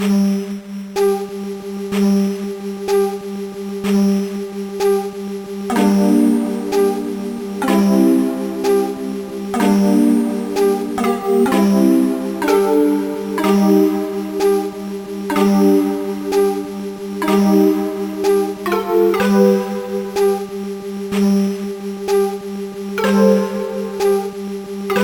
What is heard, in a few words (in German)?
dur moll; MusicLine